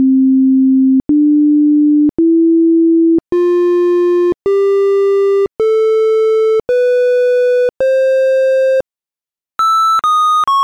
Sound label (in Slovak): cdur tóny